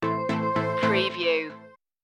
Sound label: reflections stinger